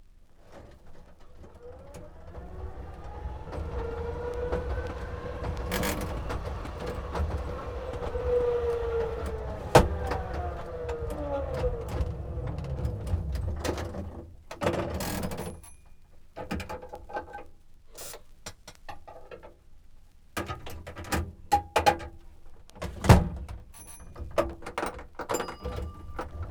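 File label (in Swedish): Spårvagn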